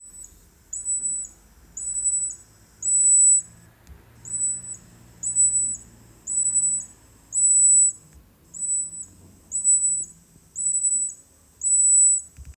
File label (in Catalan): ferradura grossa, sons ratpenats; ratpenats plafons